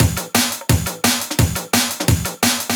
Bank Holiday Beatz, breakcore breaks

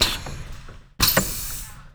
ZISH CLAC, Anime Sound FX, ROBOTS

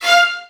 VN FX, Virtuoso Strings

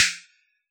SD Snap Hi; 155 DJX Kit

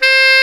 C 4, TENOR SAX; AKAI S-Series CD-ROM Sound Library VOL-7

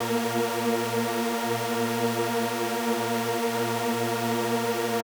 SuperSaw A#4; KG MegaSaur; M8

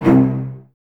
Cellos, String Ensembles; C 2; Miroslav Vitous; LINKS ORCH

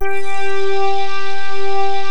RAINMAN; NorthStar - The Wizard VOL-2

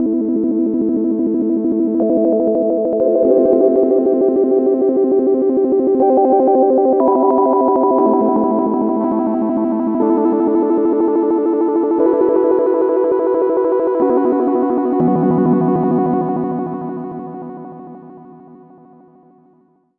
Flittery, Synths, Modular Electronica; Sonic Foundry (Sony Creative Software)

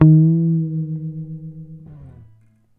D#2 GTR TRIK; Univers Sons; Jazzistic

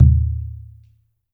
NATIVE, ETHNIC DRUMS; Spectrasonics; Liquid Grooves 1 & 2

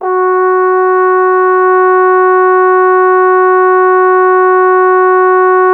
Trombone F#; Miroslav Vitous - Solo Instruments VOL-1

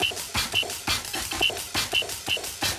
FX, Loops, breaks; M8

